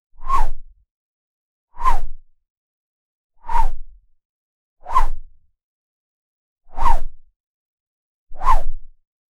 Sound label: soft asmr anime sword who; ribbon